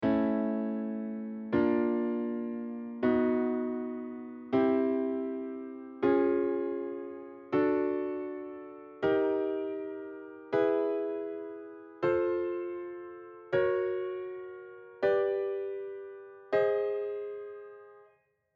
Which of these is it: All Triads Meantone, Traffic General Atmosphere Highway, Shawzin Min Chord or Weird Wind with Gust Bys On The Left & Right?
All Triads Meantone